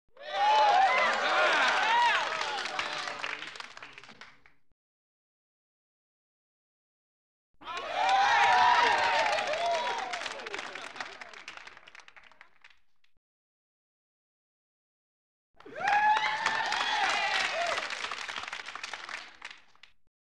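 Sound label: Crowd 100 Interior Canned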